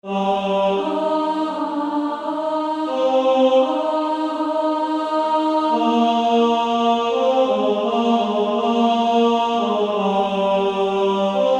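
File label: Female Choir alto 2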